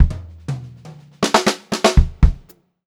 122 funky fill, funk groove drumloops; USB Soundscan vol.38; AKAI

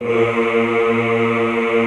EHH A#1, Classical Choir; Best Service

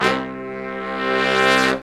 brass longs, Brass Sections, Horns; Roland LCDP06; R&R Horns; R&R Sect.sfz

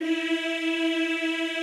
EEH E3, Classical Choir; Best Service; Extended Classical Choir

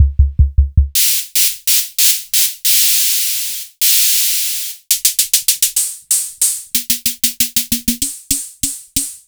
Hammond Rhythm2

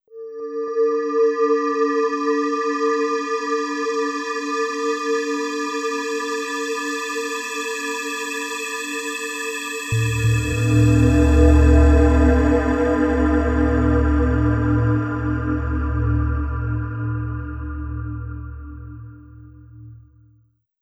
Bell Atmosphere; Sonic Foundry (Sony Creative Software); Slow Silhouette

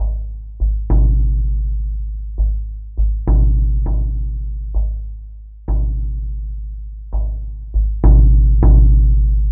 Jug Low Pulse

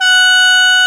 F#3 SHENG; CHNESE SHENG; AKAI S6000 CD-ROM - WorldSounds

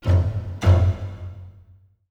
FULL ORCA B; East West; Symphonic Adventures

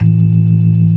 ORGAN, C3 NO PERC; Keith Emerson Signature Series

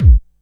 SUB KICK; Best Service Alpha Dance vol.2

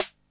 KIT, Dance Mega Drums; Best Service

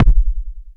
Tech BD, Yamaha FS1R

